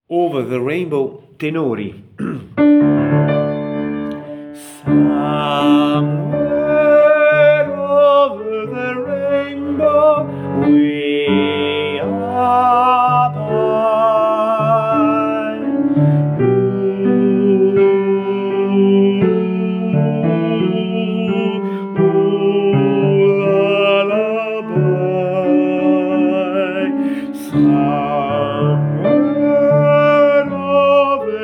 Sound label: tenori, coro